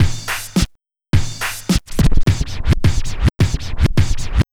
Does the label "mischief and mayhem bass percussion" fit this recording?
No